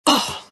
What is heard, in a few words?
pain; dota; phantom assassin